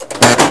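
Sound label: Target Bank Reset, pinball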